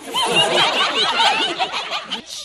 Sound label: laughter group; ooh ahh